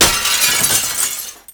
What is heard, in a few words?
Glass Break; Decors; Collisions